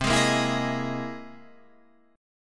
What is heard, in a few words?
C 7th Sharp 5th Sharp 9th, C